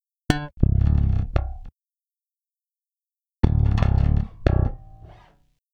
Electric Bass

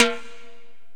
Korg x5, Drums

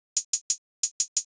Hi Hat

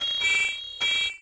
MET SW SIN, SWORDSING

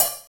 HH, REAL

